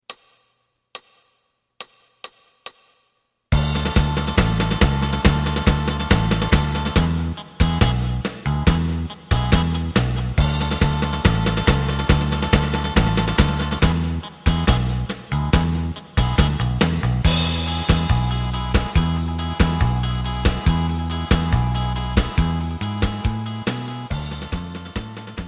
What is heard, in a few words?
lead